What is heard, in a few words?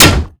impact riotshield; weapons; Modern Warfare